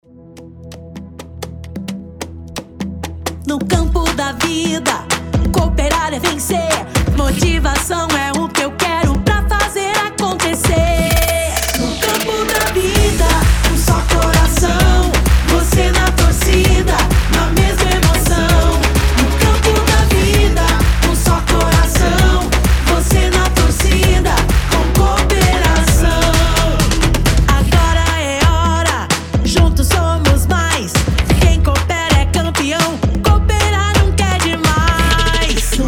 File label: jingle; integracoop